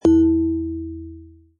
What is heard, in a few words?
message; space pew